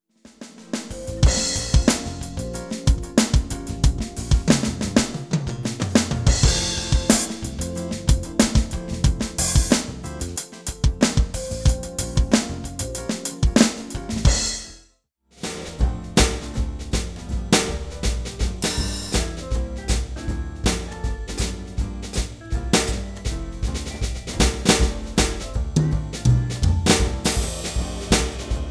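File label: Alesis DM10mk2 Playalongs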